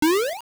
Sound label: Jump; Link jumping